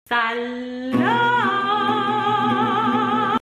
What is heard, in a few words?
guitar